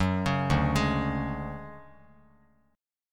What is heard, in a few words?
D Major 7th Flat 5th D down Bass Standard, chord; arpeggiated; JGuitar